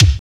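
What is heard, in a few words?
BD, bass drum; X-Static Goldmine 1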